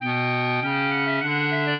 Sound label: clarinet; Mozart